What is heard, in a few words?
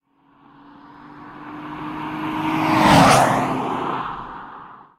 car; Blind Drive